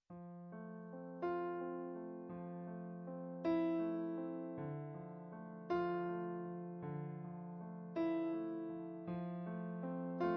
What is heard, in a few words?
80bpm lofi